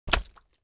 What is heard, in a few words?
Cartoon splat